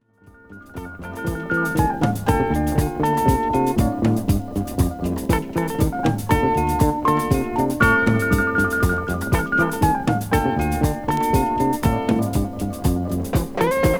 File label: singing